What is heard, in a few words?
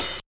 hat; Hats